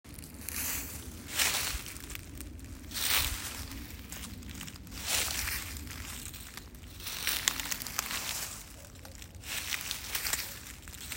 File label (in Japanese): 冬の音